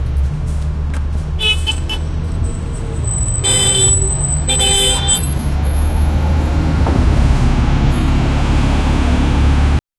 realistic street level ci, car horns

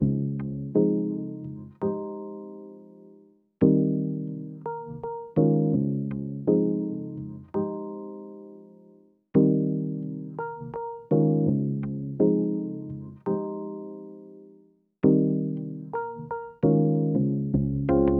smooth chillhop groove wi

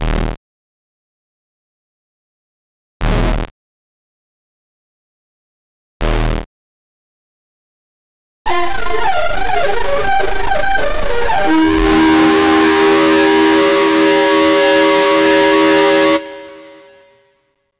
game over sound 8 bit

